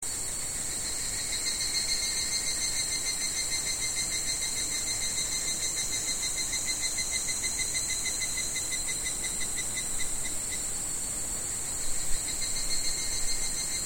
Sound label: insect